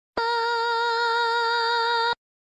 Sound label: scream